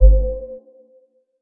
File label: Beam Miss